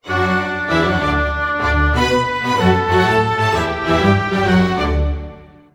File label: 95 D maj full mix, Orchestral arrangements